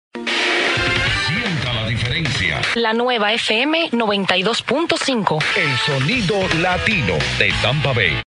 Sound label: Latin sound sweeper; WYUU